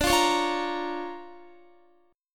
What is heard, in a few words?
D Minor Major 7th Sharp 5th, D, Guitar; DmM7#5 strummed; JGuitar